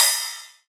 Royality free crash cymbal drum sound tuned to the D# note; verby; D sharp key; drum sample